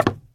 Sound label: shelter step; Original TK Sounds